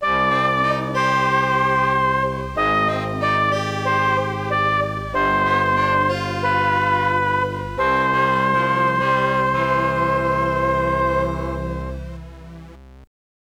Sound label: game over; Super Hydlide; The 16bit Audiophile Project; Genesis